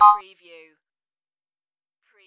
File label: musical